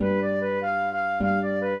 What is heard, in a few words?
trio, flute harp; Mozart